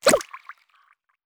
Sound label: Grab Wall